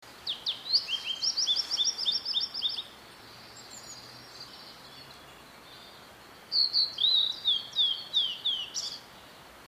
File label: oruri